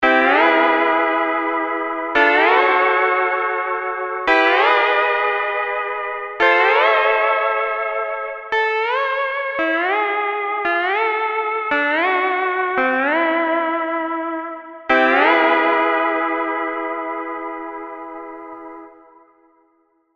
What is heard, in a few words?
lap steel; lap steel guitar; tiki instruments; chiqui audio